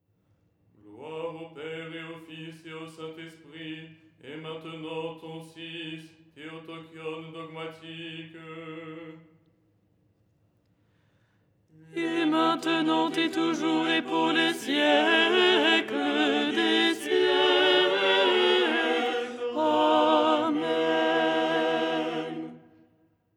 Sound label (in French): alto; " Gloire… et maintenant… "; Les 8 tons en français; ton 6